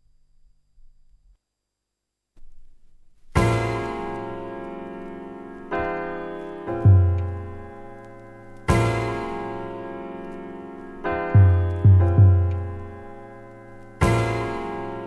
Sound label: Drum and Bass Jungle and Old Skool; UK Bass Radio